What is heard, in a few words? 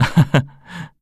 laugh; Eternal Return; KOR